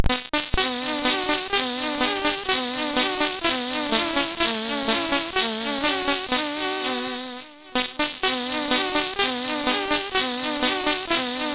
Echo Melody

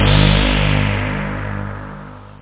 stab; OctaMED SoundStudio V1; rave

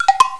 woodblock

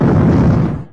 Large Explosion; Retro Gamer 17; 2D Shooters; galacticgladiator

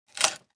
make furniture

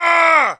argh; Free Hearts; Gambling

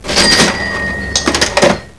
cash

